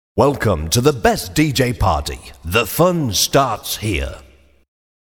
Christmas Jingles

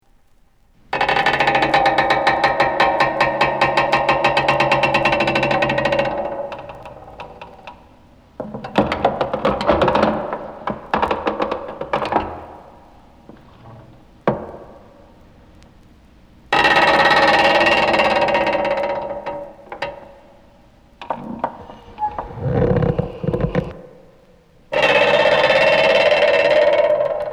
Creaks; Halloween; Haunted House

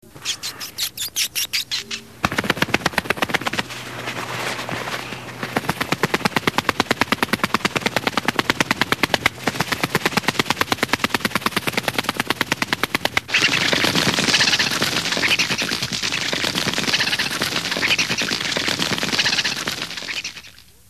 Bats; Halloween; Hallmark Halloween 1989

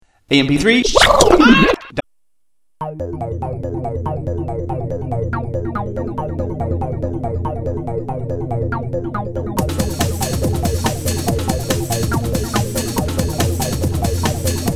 Trance

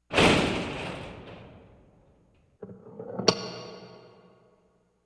Gate Closing; Halloween; Halloween Sound Effects - Sounds Of Horror

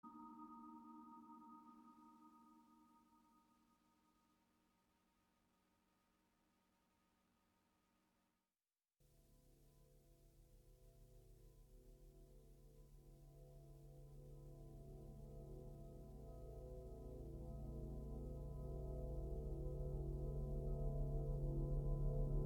Natural Light, Ambient

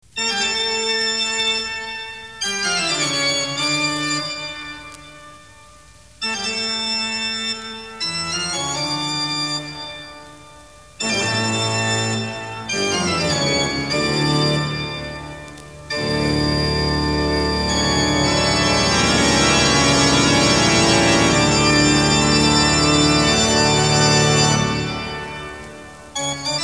Phantom Organ; Halloween; Hallmark Halloween 1989

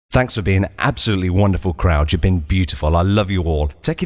Christmas Jingles

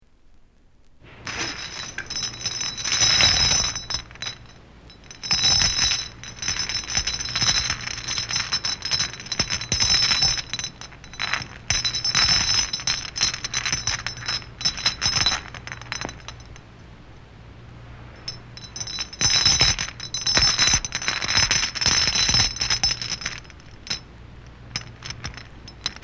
Chains Rattling; Halloween; Haunted House

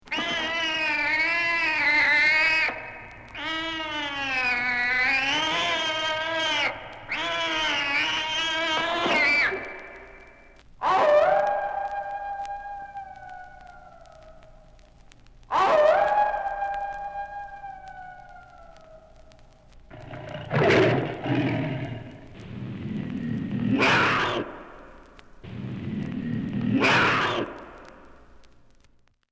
Mean Cat, Howling Wolf, Mad Dogs; Halloween; Ghostly Sounds: A Haunting Experience; Power Records

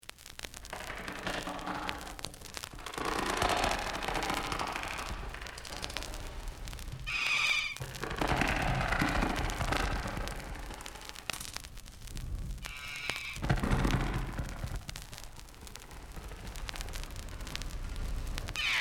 Bats, Ghostly Sounds; Halloween; Power Records

